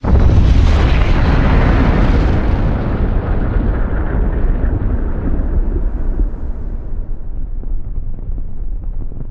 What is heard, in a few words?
city explosion